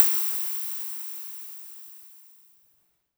polyvox hat, Drum Machines, Formanta Radio Plant Polivoks